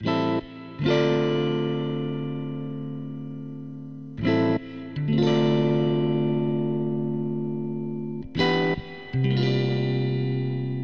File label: strat chord, strat 11th chords, variable strum